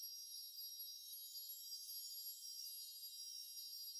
sparkle loop; background

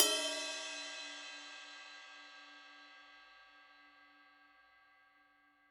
Ride Stick Bell; Drums

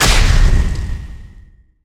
kill layer boss, hit

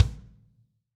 Kick Plastic 90s Heavy Rock

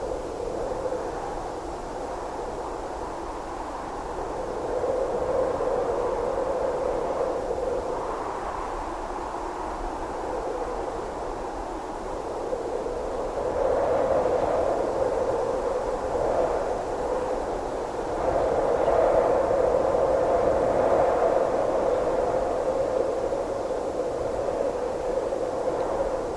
Sky Ambience Quad; Journey

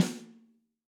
Snare Stick Hit

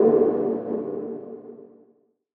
FX Verb Synth; FX Hit; Drums; Ableton Live 12 Lite; Core Library

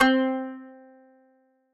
Kanun C3; Guitar & Plucked

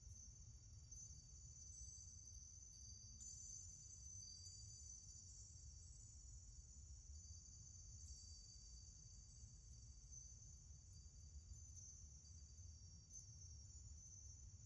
Floating Symbol Ambience; Journey

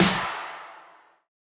Snare Soft Ring, drums

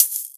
Hihat Closed Hiss

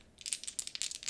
rolling, dice; Qt 6.9.0

